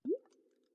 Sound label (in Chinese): 水下气泡声; ambient